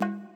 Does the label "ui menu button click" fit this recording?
No